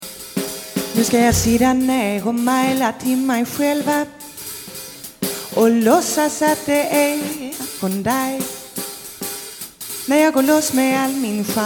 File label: Jazz